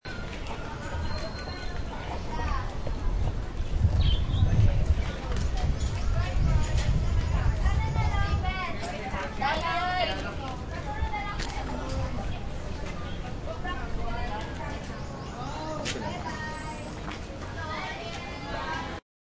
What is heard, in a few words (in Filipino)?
ibon